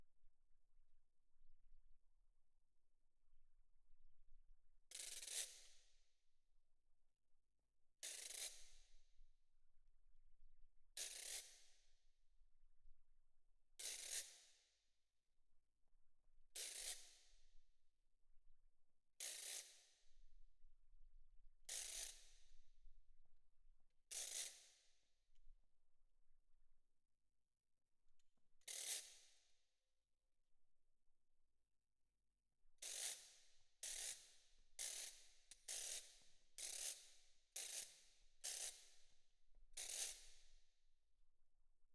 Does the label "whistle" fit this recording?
No